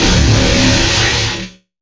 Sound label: gasp, robot player